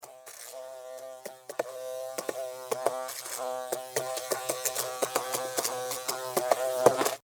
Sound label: Fly, Window, Hit, Surface, Buzz, Wings, Insect, Bug; Flies; Animals:Insects; Blastwave